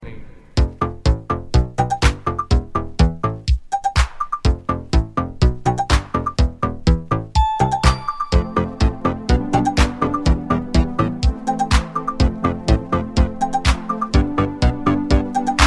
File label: Italo Disco